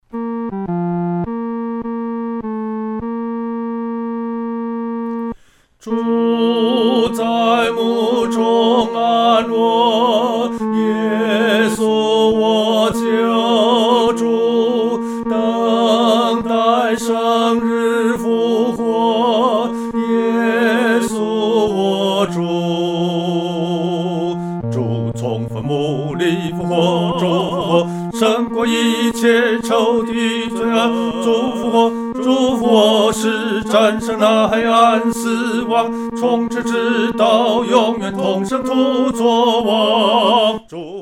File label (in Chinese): 独唱 第三声; 基督已复活